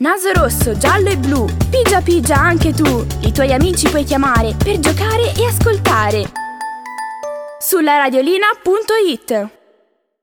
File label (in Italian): Jingles